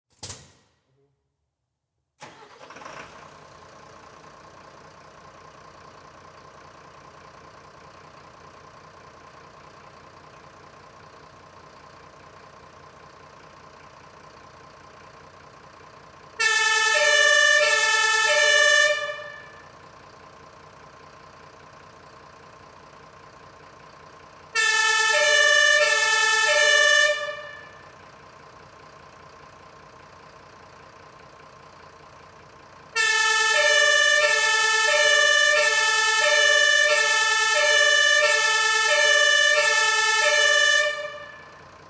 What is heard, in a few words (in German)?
Martinshorn mit Fahrzeugstart